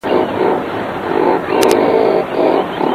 alca torda

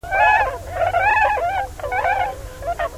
cygnus columbianus

